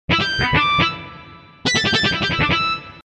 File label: guitar Harmonics